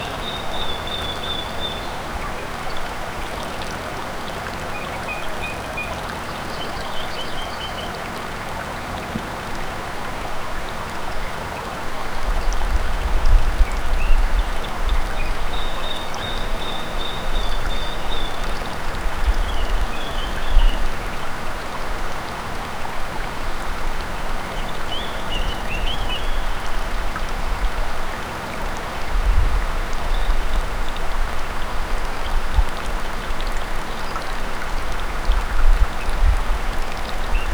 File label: gentle rustling leaves, bird chirps